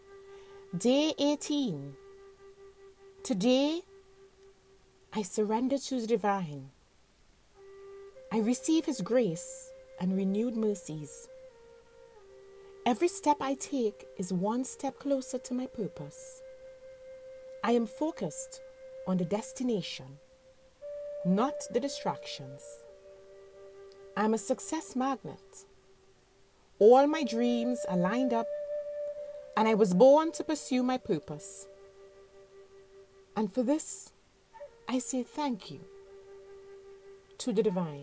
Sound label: healing mantra